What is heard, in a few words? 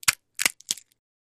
Nut Cracker Cracking